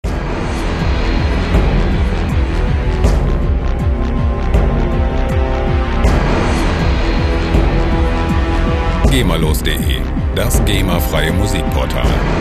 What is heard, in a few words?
epic cellos